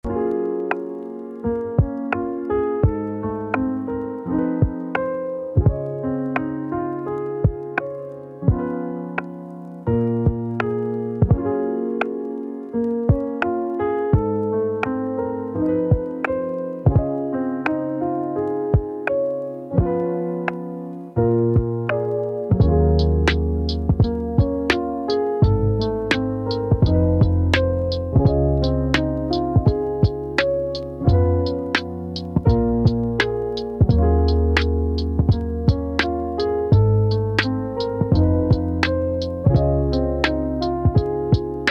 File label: moonlit wave chill lofi